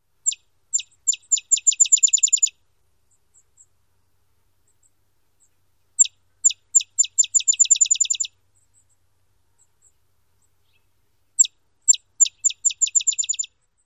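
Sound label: songs, Rufous-winged Sparrow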